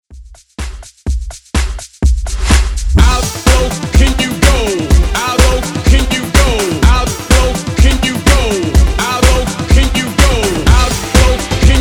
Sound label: 90s Hyped Redrum